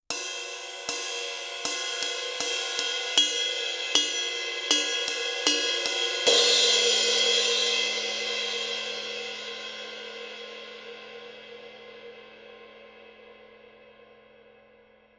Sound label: RIDE, ライドシンバル